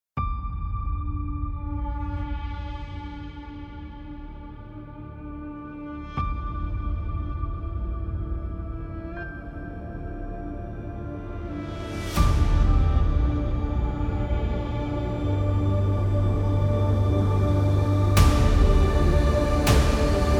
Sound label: Intro Heroic Epic, motivational epic music